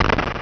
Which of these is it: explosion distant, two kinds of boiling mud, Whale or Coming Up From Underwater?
explosion distant